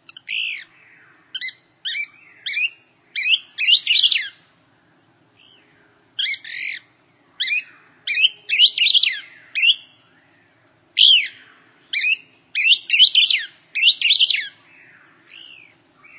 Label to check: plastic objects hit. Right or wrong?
Wrong